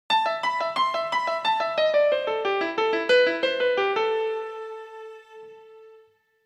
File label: blues